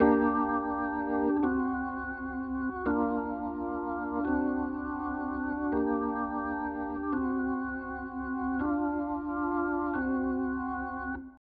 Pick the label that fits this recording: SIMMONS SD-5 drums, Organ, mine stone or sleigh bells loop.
Organ